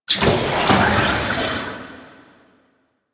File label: Ocean Injection Needles; Ambience; Warframe; Grineer Ocean; Ocean Sabotage Injection